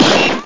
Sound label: it's a gun sample